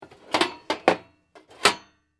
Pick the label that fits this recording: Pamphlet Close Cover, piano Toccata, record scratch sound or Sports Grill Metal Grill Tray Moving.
Sports Grill Metal Grill Tray Moving